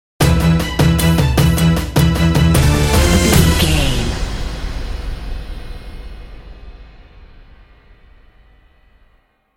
orchestral tension and suspense sting

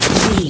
footstep snow land

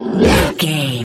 troll monster effort action release

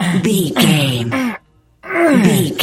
voice male effort breath lift

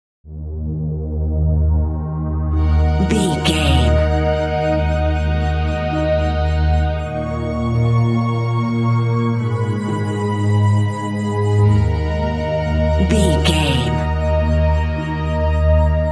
mysterious space alien pads

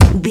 punch low deep impact

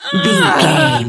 voice female death

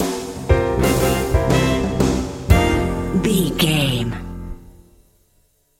jazzy stroll sting

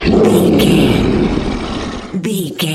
troll monster growl

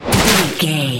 water spell impact hit

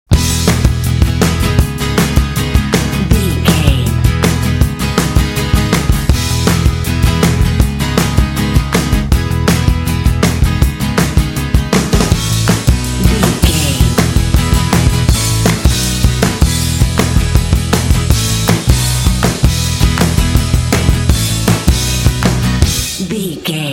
pop upbeat happy fun bed

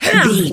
voice female attack

